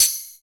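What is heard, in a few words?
PER PROCU, Procussion, percussion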